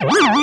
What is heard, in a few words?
working, signals; AKAI; FX SIGNALS